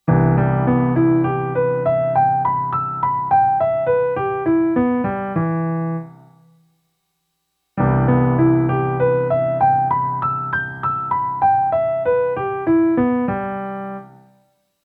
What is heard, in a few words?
E minor arpeggio Inversions Sustained Chord